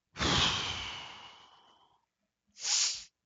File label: breath; GameplaySequenceDemo